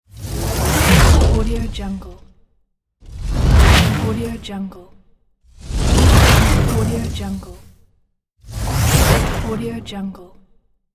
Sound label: transforming whoosh